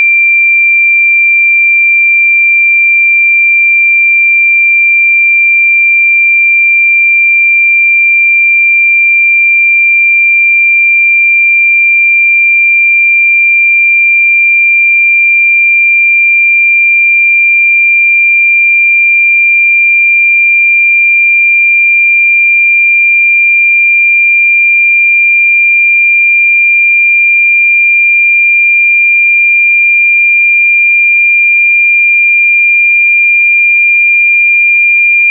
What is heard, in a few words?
2397 SINE LOG; SINE WAVEFORMS